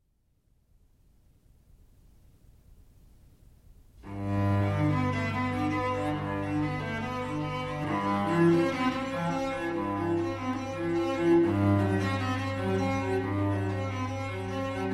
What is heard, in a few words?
Bach Cello Suite